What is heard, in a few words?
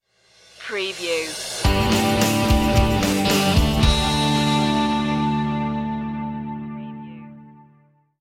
pop celebration stinger